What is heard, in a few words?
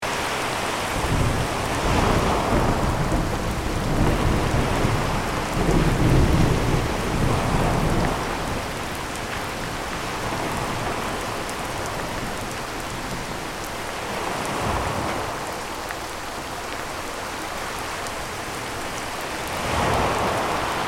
Ocean storm ship ambience loop